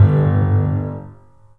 THUD, The Piano; InVision Interactive

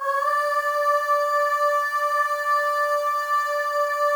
AHS, FEM; Spectrasonics; FEM.1 AHS

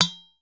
udu

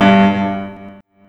PIANO ECHO; Big Beat Samples & More; AKAI; KEYBOARD KIT

